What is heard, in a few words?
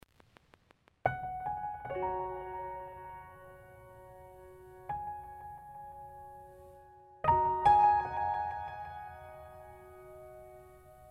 Piano Music